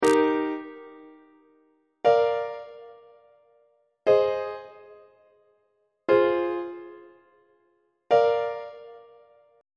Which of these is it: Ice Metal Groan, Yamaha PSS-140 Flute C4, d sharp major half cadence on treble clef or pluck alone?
d sharp major half cadence on treble clef